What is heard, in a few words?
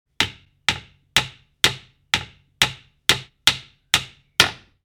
Hammer hitting small wood window hammering; gfxsounds